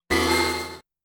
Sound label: enemy hit